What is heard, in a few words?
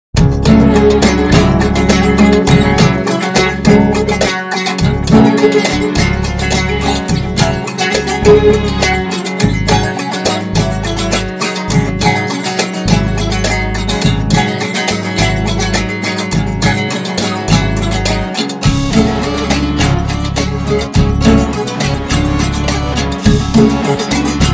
Hurdy Gurdy version